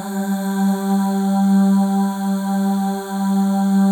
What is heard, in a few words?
Voices, FEM AHS; Spectrasonics; FEM.2 AHS